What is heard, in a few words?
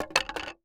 Impact Wood Broken Chair Drop On Cement Floor; Impacts